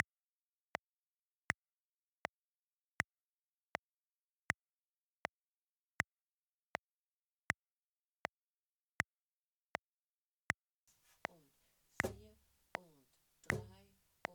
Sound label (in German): Play-Along Zwei schwedische Schottisch Teil 2 Cajon